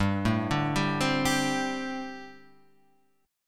G Suspended 2nd Suspended 4th G, Guitar Half Step Down